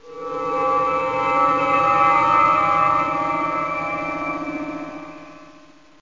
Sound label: transporter; trek